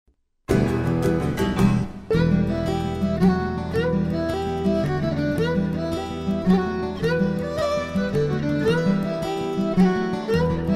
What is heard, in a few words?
VIOLIN